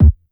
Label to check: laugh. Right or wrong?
Wrong